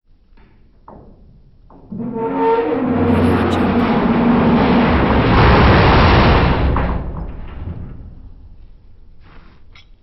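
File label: metal screech dumpster lid; prosoundfx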